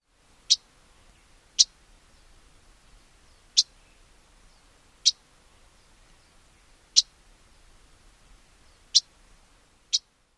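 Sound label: Lucy's Warbler, calls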